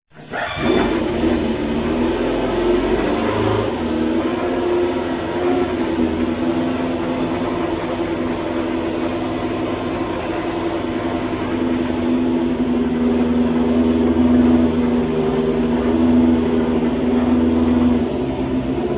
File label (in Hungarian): Tyrrell 011, motorhangok; autosport